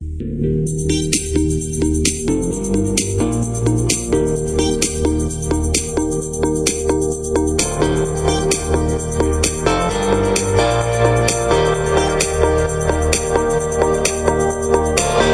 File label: original extended instrumental mix, disco